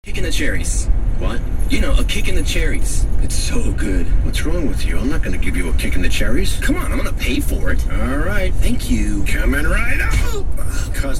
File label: kick